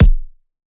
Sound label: Punching Perc, Straight Glove